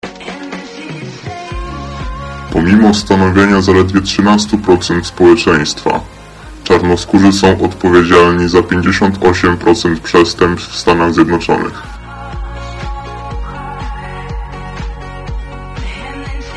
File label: applause